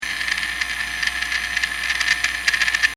Toy robot move loop, Toy, Small: Robot Move Effect Loop; Miscellaneous Toy; Stuart Duffield